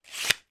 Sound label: industrial tool tape measure retract short length